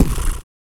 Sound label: PIERCD LIPS, vocal; ILIO; Vocal Planet VOL-2; Blues & Soul